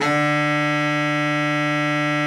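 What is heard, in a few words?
BARITON, baritone sax; Best Service; Brass Super Section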